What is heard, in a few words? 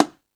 Latin Layers, Drum Machines E-MU Pro-Cussion